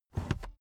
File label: car headrest full adjustment fast soft